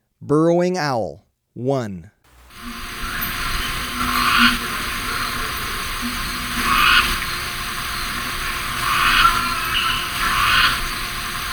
Burrowing Owl; S. America

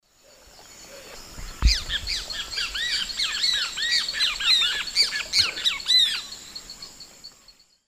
Pitangus sulphuratus, alarm call; S. America; Eastern Lowlands

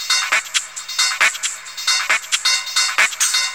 Drums Techno

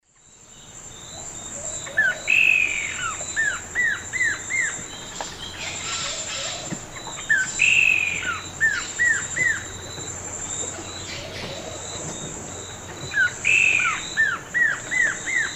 Cercomacra nigrescens, duet; S. America; Eastern Lowlands

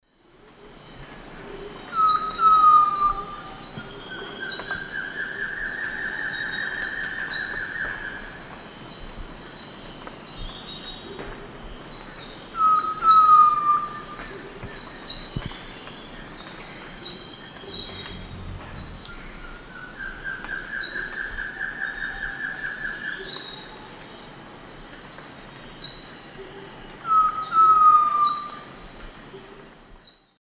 Grallaria dignissima, song; S. America; Eastern Lowlands

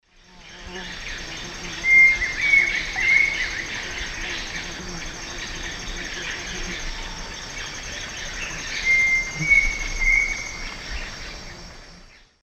Dromococcyx phasianellus, song; S. America; Eastern Lowlands

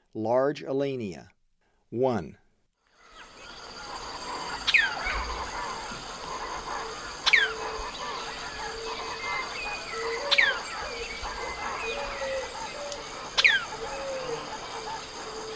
Large Elaenia; S. America; Eastern Lowlands

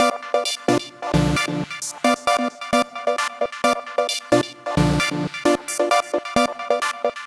Lead Synth

